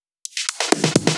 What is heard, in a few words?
Fill 128 BPM; Vengeance Samples; VEH1 Loops